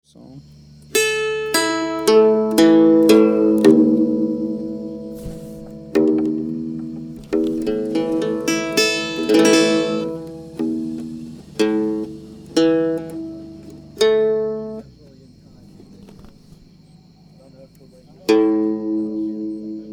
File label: Tuning